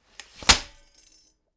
folding chair unfold fast

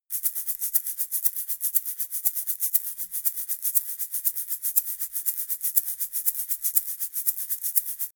eggshaker fast long

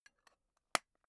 tobacco tin close

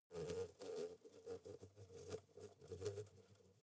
hornet hum

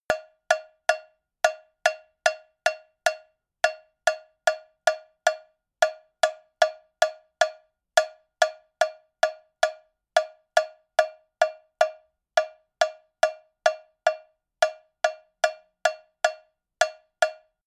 cowbell rhythm

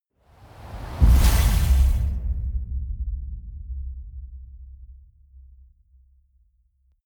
gust hit

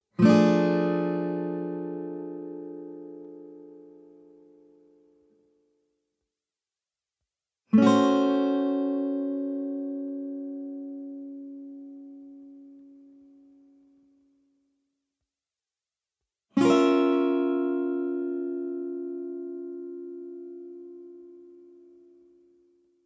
western guitar chord high diminished

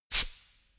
pneumatic pump short burst

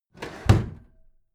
packed drawer smooth clatter close heavy halfway

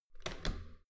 metal door heavy handle down soft

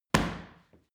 paw kick hard

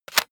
plastic device handling clack push

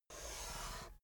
chess piece move

